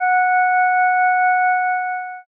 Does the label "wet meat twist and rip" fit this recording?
No